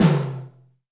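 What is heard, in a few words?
tom drum, right; Best Service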